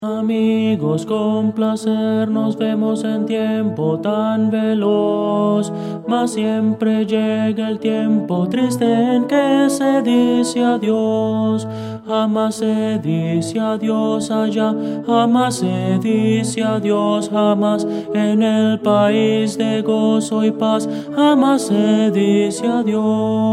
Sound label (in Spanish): tenor, himnos cristianos